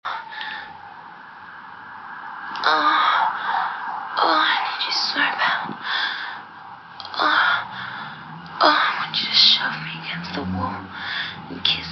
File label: Aussie moans